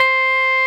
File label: Roxichord C5, RMI E.Piano; Keyboards of The 60's and 70's